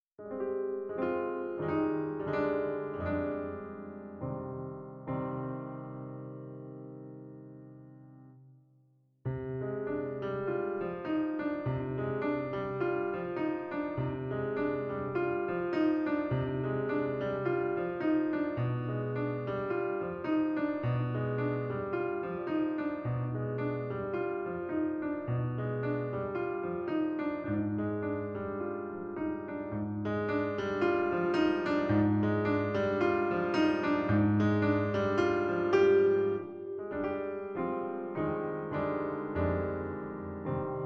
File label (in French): accompagnement